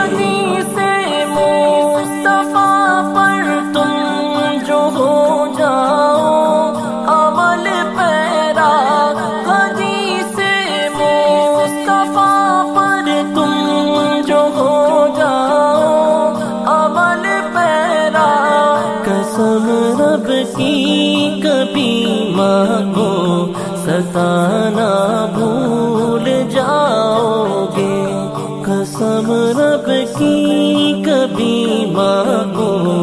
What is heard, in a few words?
Islamic Ringtone